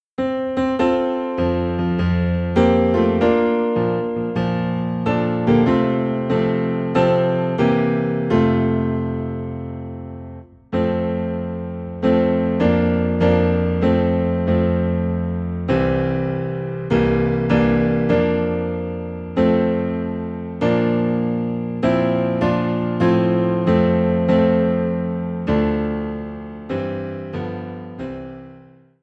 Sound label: Piano Low in F, hymns; Christ Arose